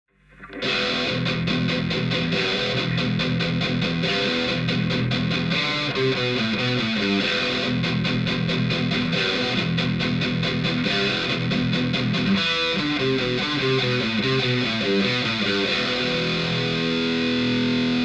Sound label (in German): Superlux R102 E Gitarre 90°